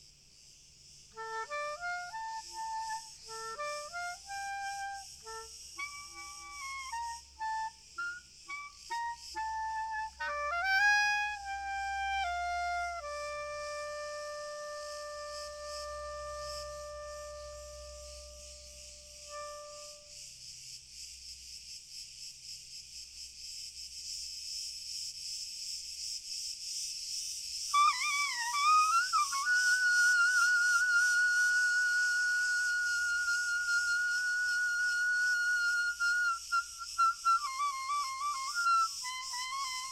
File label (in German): Stand Schoen Hoch Frei Spiel Grillen Chor Cl Fern